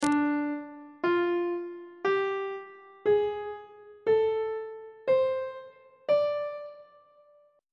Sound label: d blues scale; treble clef